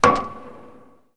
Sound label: Baseball Hit Metal Pole